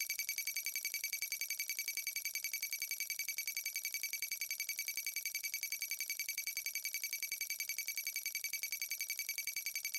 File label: win incrementing; Highway B